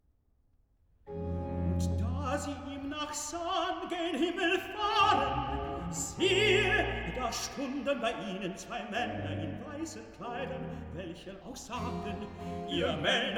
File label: Recitative (tenor); Johann Sebastian Bach; Lobet Gott in seinen Reichen BWV11; tenor Evangelist; Archiv